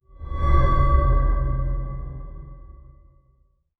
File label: a brief tension filled; atmosphere